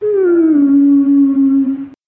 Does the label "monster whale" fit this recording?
Yes